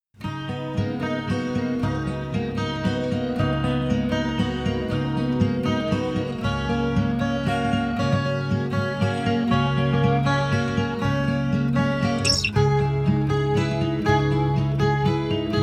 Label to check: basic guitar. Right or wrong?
Right